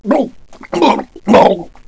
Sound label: male drown; jcdenton